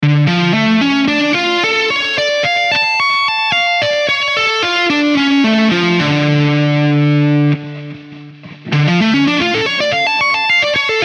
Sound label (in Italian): Re min7